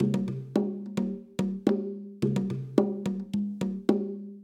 Intro Rhythm Loop; Beautiful Girl Fight School; CR Afternoon Tea

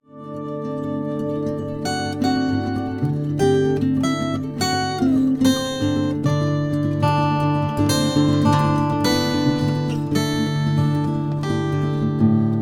Camp Guitar D

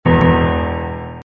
Stage Fail; qualification of hero collecting princesses ios gamerip 2016

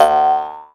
boing, water balloon; Birthday Party Bash; Wii

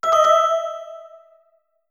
Piggybank Reach Max; Ellen's Garden Restoration; android

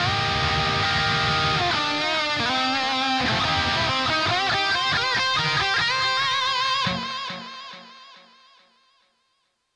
Drums and Jingles Camping Lead; Metal Tales Fury of the Guitar Gods

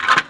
crane release

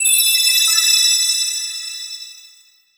magic

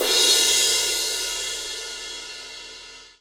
Crash

